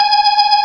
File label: B3 G#5